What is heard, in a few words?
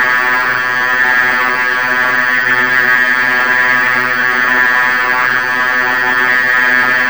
Cockpits Sidewinder Low; DCS